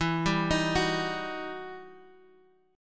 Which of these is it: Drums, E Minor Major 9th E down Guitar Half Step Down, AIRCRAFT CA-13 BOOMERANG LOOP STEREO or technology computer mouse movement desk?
E Minor Major 9th E down Guitar Half Step Down